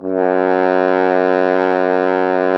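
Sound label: French horn; Sound & Vision - Gigapack I CD 2 (Roland); Brass&Woods1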